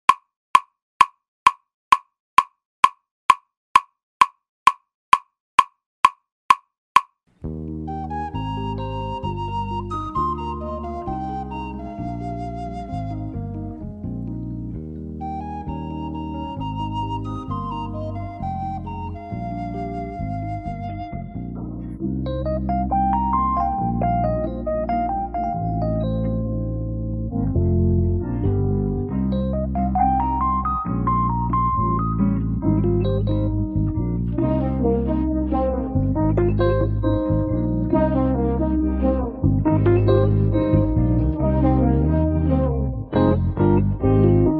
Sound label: Drumless